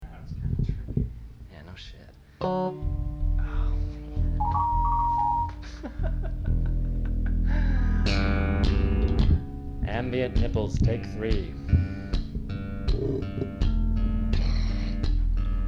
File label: Ambient